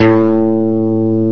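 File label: guitar single rock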